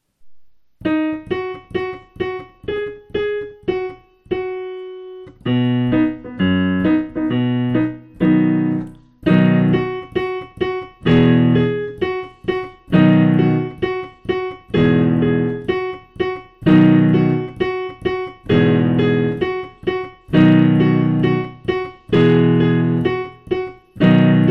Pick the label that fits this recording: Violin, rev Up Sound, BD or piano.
piano